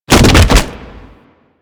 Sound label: Infested Runner Bodyfall; Warframe; Runners; Foley